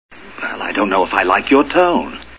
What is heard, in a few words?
tone